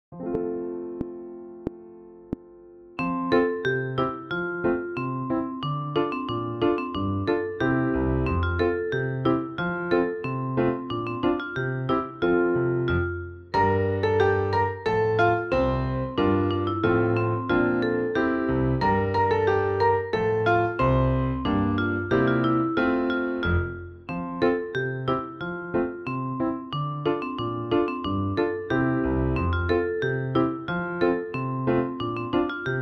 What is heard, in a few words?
Piano Vocaline; Les 1 Oefening 20